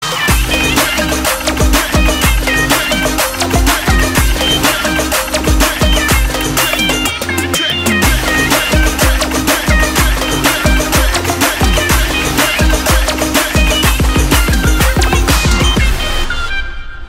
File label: BGM Ringtone